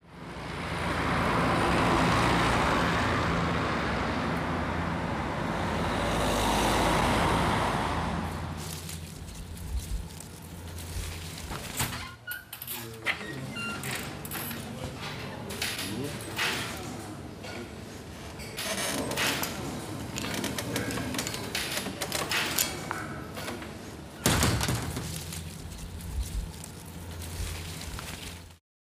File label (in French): carte postale sonore